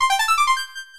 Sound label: pickup